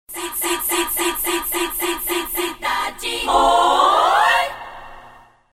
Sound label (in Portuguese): Vinheta; Cidade FM